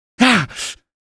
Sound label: pain, male